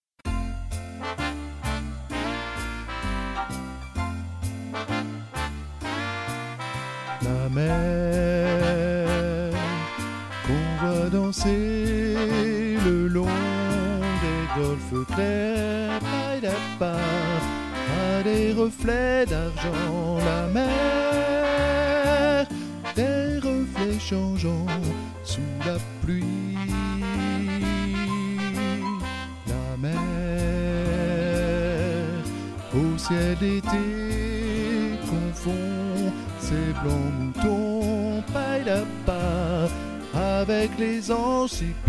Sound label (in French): soprano; mer